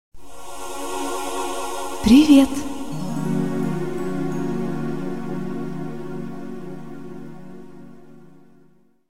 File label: Windows Privetstvie